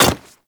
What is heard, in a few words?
Hyena Micro Step Default, Footsteps; Warframe